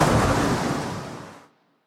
bullet impact woosh

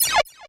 Motion Design Downward Shimmer; SoundsCrate

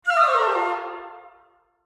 woodwind run down

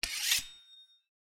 Draw Sword; soundscrate

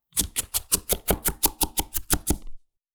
Fast Chopping; soundscrate

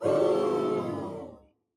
audience boo

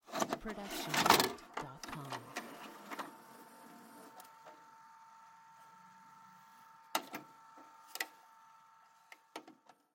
VHS start play

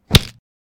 brutal hit

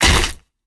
body impact ground

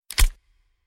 gun handle; soundscrate

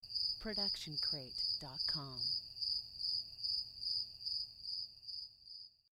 crickets

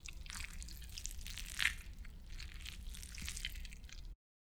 stylized squish; soundscrate

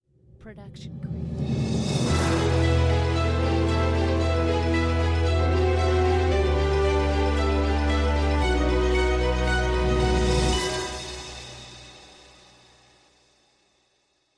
Corporate Ident Orchestral Fanfare; SoundsCrate

